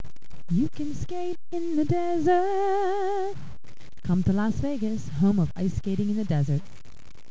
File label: skate